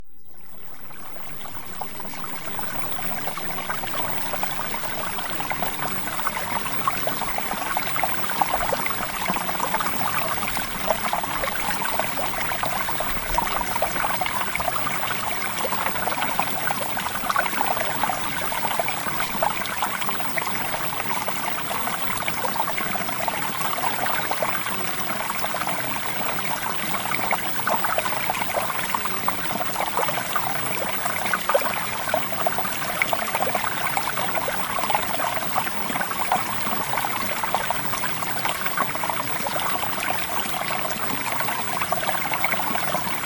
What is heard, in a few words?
Water; SEXUAL MAGNETISM; Premium Subliminals